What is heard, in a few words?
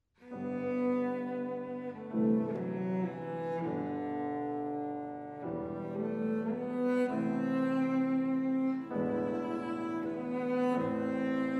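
piano trio; jadassohn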